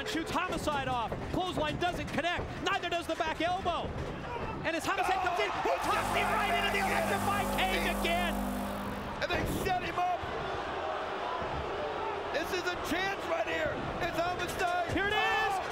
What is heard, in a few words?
electric fence bump; TNA, 2007; Team 3D